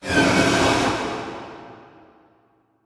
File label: Sword Drag; silent hill homecoming 2008 gamerip pc ps3 x360